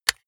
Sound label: jigsaw button off; maschinen; saege; soundpool